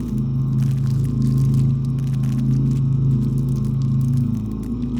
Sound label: ship weaver idle; Stellaris; biogenesis; ships